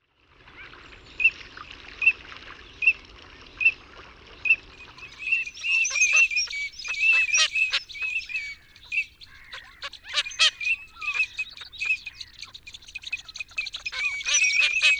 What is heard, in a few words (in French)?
Sarcelle d'hiver